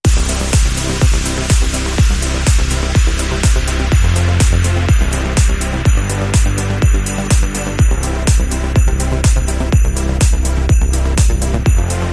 Big Banger Beats Rhythm

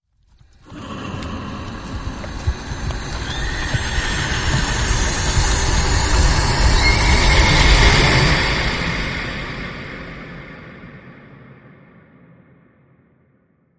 Unicorn Majestic Rise

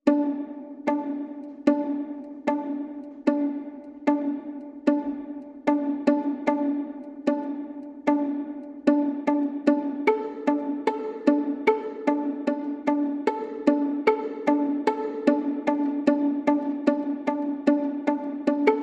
No Piano

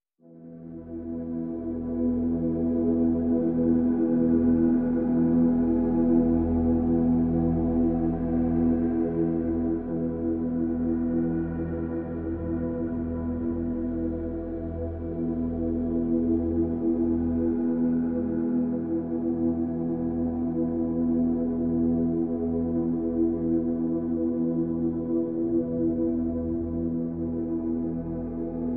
Pads (E)

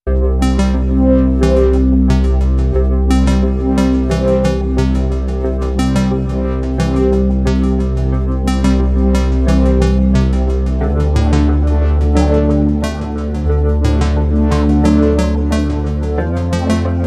Ambient

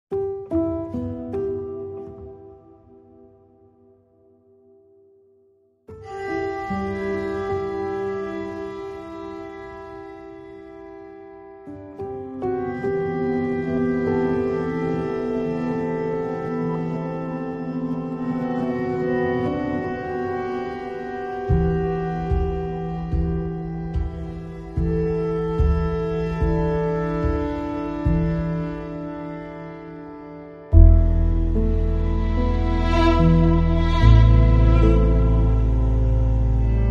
No Flickering Piano Arpeggio No Wind SFX

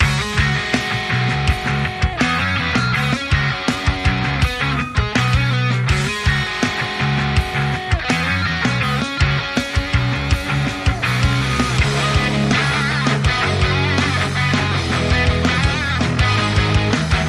Metal Groove

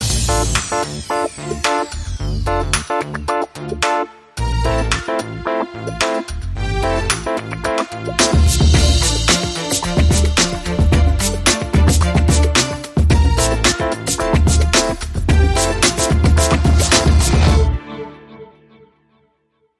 Pop RnB Ramp Build

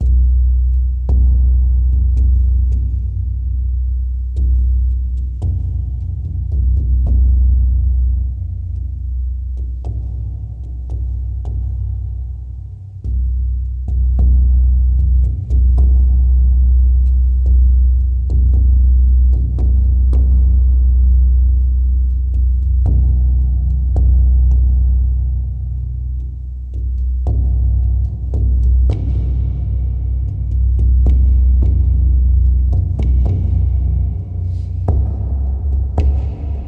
Underscore

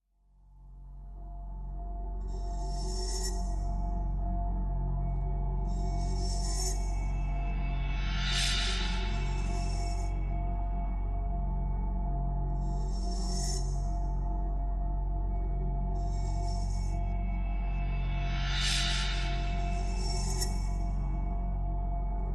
Drones Only

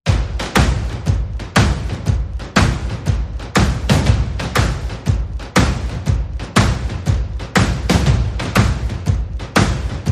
Shuffler, Bass and Perc Only

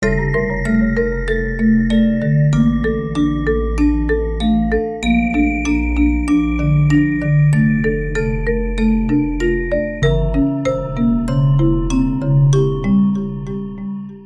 Swirling Marimba; Sting

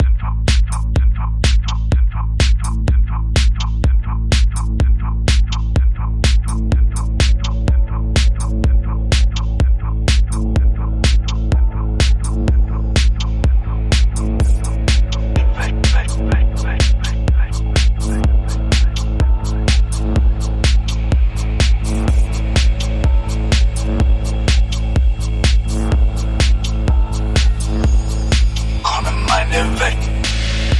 Drums Vocals Bass

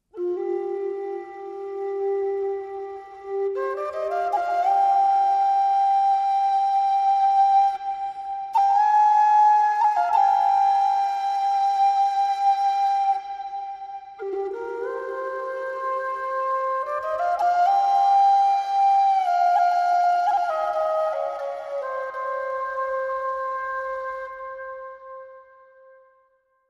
Solo Tin Whistle

